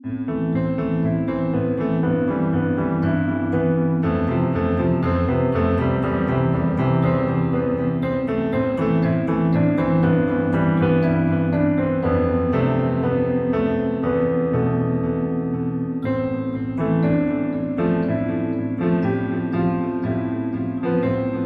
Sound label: Beta Piano